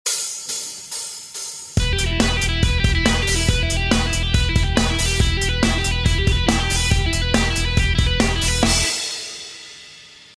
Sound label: taps